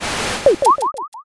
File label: gacha roll